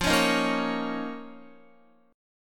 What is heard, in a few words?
F 7th Suspended 4th Sharp 5th F down Guitar; strummed